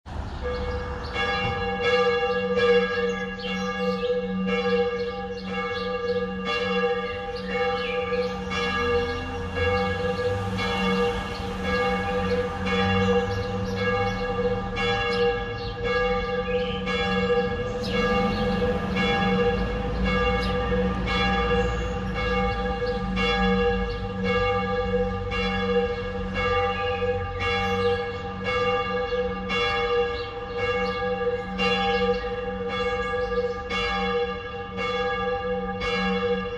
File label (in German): zweitgroesste Glocke